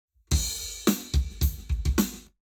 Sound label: Lab Wet Drums 90deg; eMagLS